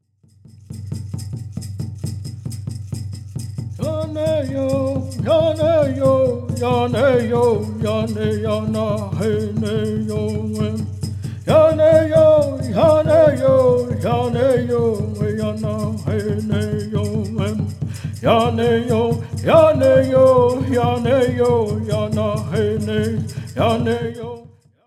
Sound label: Apache Peyote Song